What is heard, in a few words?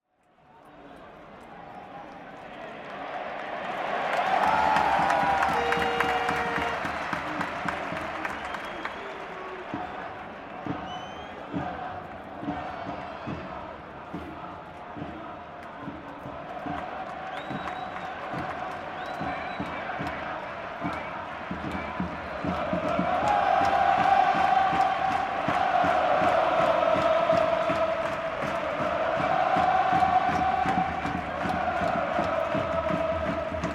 Spain Football Game Ambience Huge Crowd Cheering Chanting Enthusiastic Granada; Junglesonic